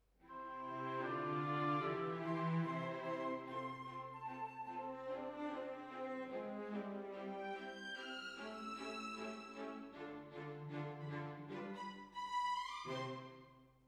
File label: Hall